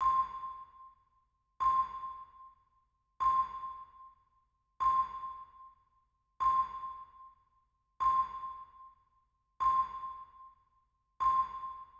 Click Track (2 Bars); Vlachos, Konstantinos

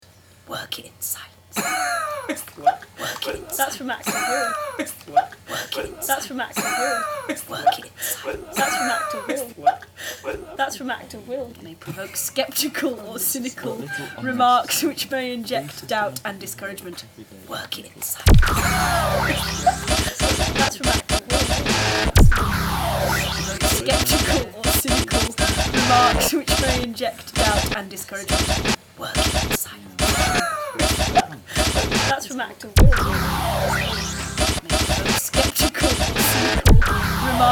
Backing Track

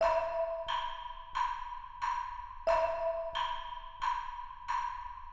Click Track 2 Bars; Vlachos, Konstantinos

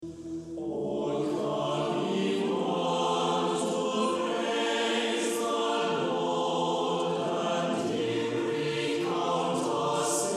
Tune

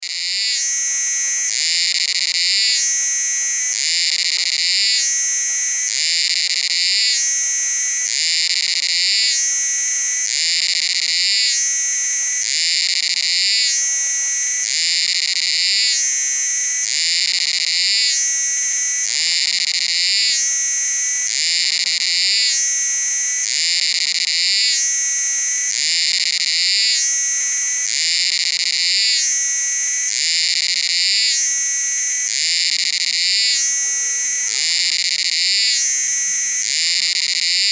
Cicada; Kearns

